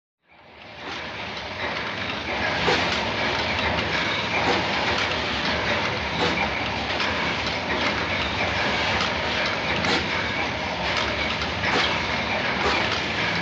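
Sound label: oil rig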